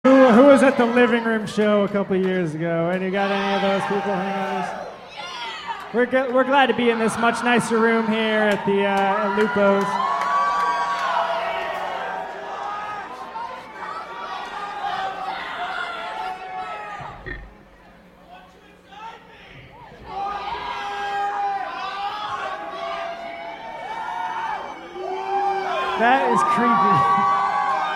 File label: Crowd Banter